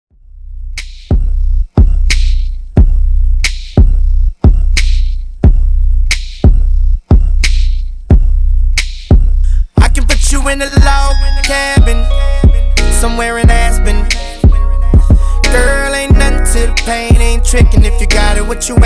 Extended 90 Bpm

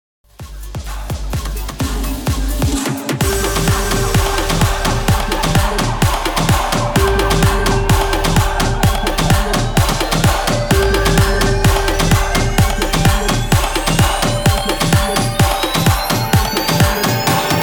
PROGRESSIVE HOUSE 128 BPM

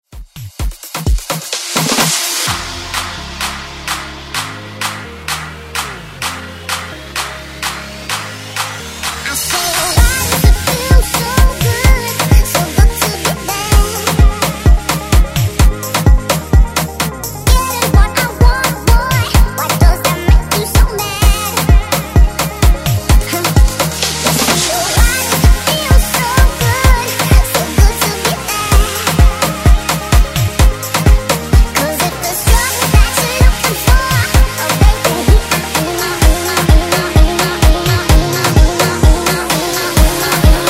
Afrobeat Redrum

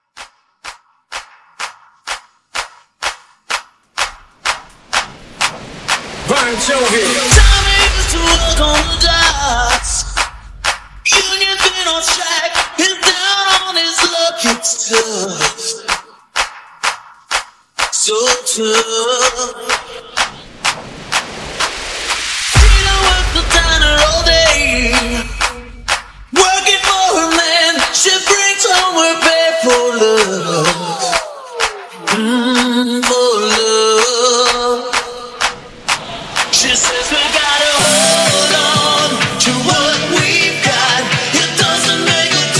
Clapapella House

